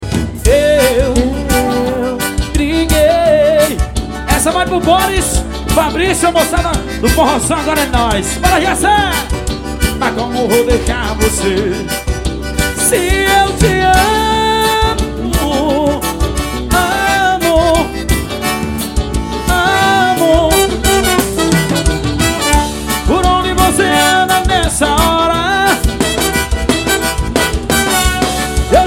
forró; manaus am

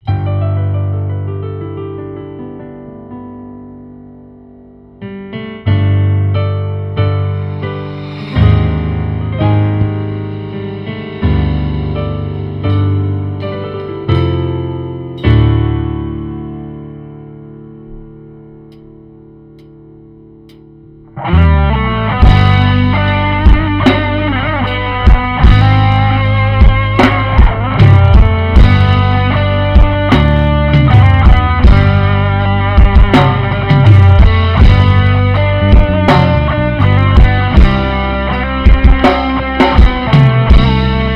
zabumbe instrumental, Forrozão

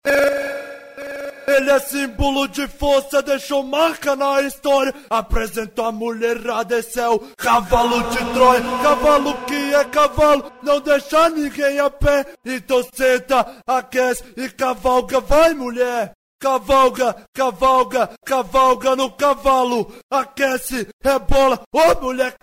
eletrofunk acapela

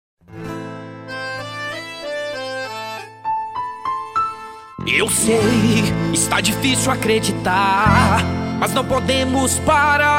jingles politicos; 2020 geraldo alckmin

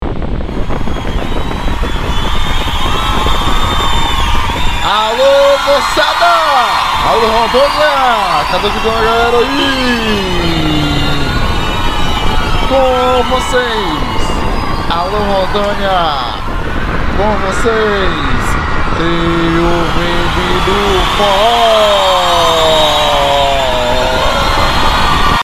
abertura forro

